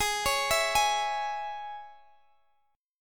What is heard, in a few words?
G sharp Suspended 4th Sharp 5th Guitar Open G chord, down